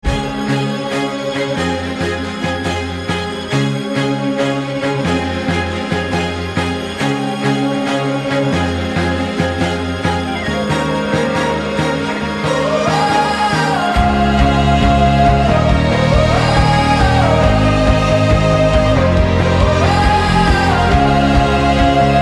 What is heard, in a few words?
Ringtone Instrumental (loud)